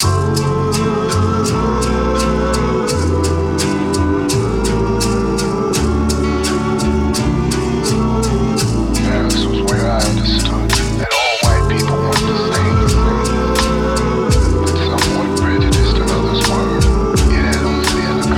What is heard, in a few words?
intro Instrumental